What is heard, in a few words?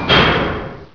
small lift stop